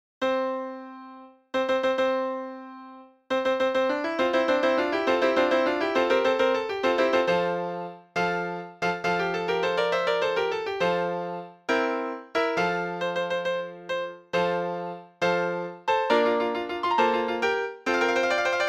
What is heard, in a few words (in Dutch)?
bruidskoor, draaiorgels